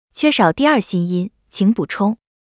第二心音; XinFeiTingZhen

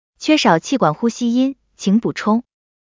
气管呼吸音; XinFeiTingZhen

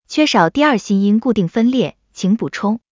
第二心音固定分裂; XinFeiTingZhen

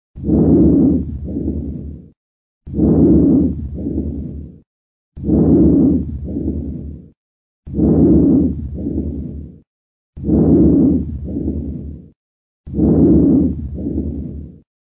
肺泡呼吸音增强; XinFeiTingZhen

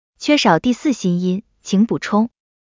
第四心音; XinFeiTingZhen

